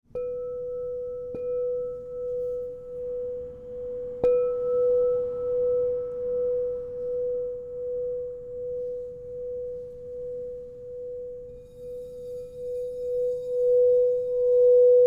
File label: Crystal Tones Singing Bowls